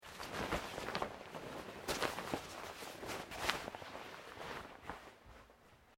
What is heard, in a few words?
JACKET SKI TAKE OFF, Movemement sounds for various articles of clothing; Skiing and Snowboarding